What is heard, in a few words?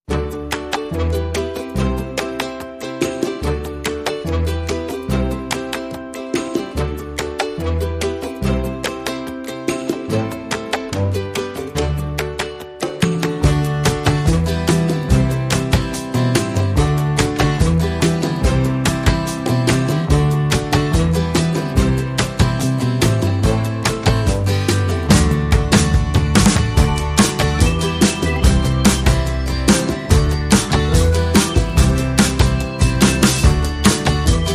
No Solo Guitars